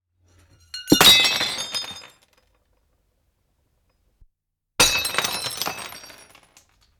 Porcelain Cup Falls And Breaks On The Floor; Kuppi Rikki

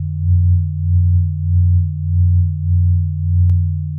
Sci Fi Heavy Scan

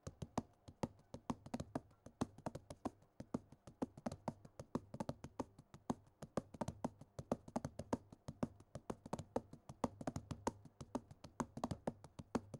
Book tapping

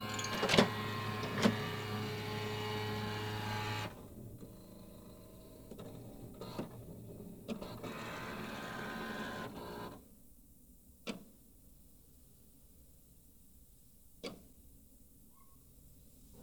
Printer Setting Up

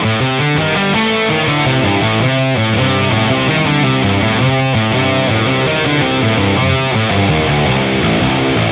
Guitar Riff Pentablues

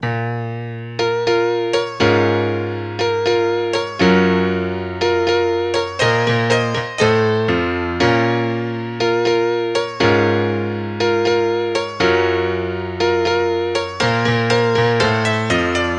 Piano loop

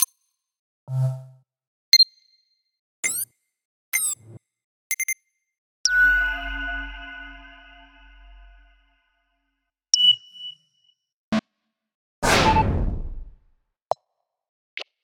Menu Sounds

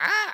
Squawk

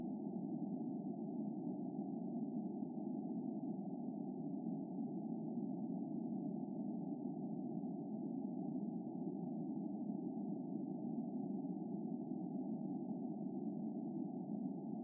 Light And Steady Wind

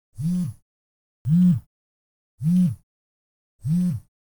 Cell Phone Smartphone Vibrate Single

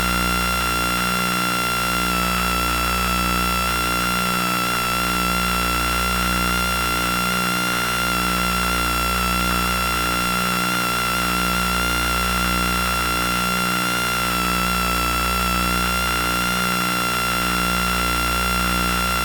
Wireless Game Controller

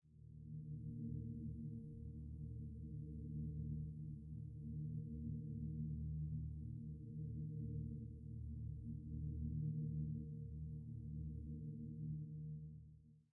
Horror Humming

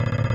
Chain

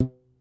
Rubber Band

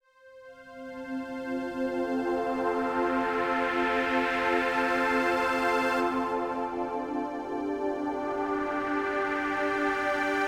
Moonlight Pad

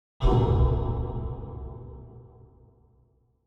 Metal Tube Medium

